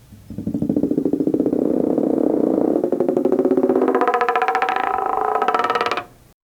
balls rolling on a

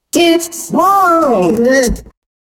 anime screaming sound

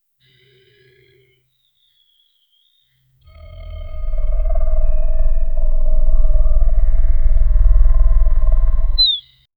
the stretching of a bowst, anticipation

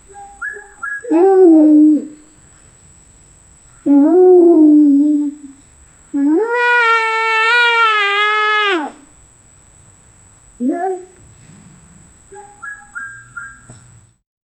an african village farts, elephant

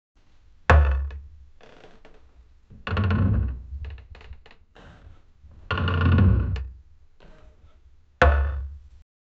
braking wooden house sound

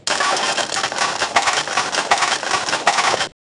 rapid digging

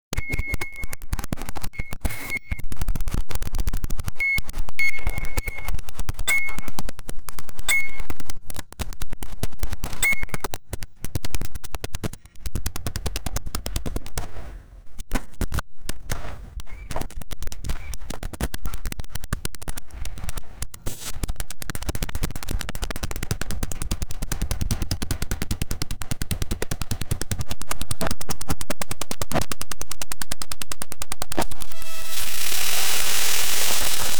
mole scare sound kids; barking

